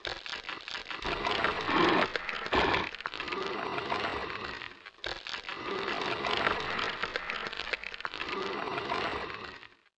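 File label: Skeleton Archer Idle; Seer; GamigoNA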